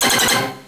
Coin; Rollergames; arcade

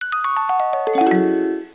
Ice Bin Bugs fill; Fisher Price Ready for School Kindergarten

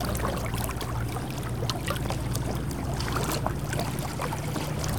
Water

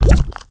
Jam; Candy Crush Soda

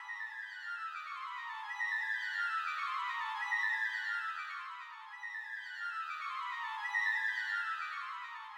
fast flutes; Sky Diving

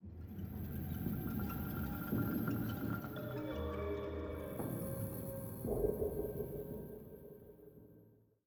85bpm; Research High; Legends of Pegasus; windows gamerip 2012

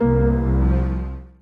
Musician Rebloom Bass

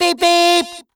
Beep beep

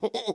effort; Scrat

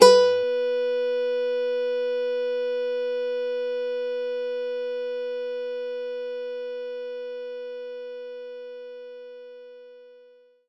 Nylon Guitar B3

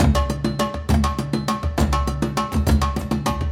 Jungle Battle Loop; gamerip; Human Rocket Person

